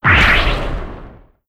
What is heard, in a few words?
damage out